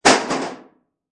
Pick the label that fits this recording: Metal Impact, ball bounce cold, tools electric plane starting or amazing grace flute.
Metal Impact